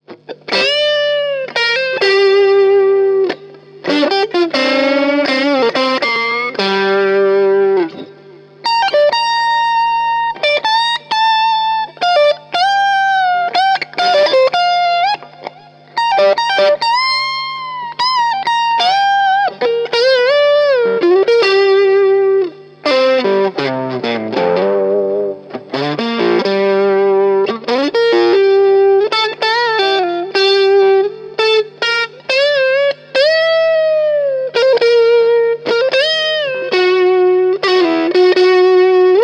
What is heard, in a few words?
lead